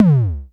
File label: Klone Dual Percussion Synthesiser tom